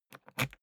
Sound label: briefcase plastic latch lock key pull out ringing; industrie wirtschaft; bueroarbeit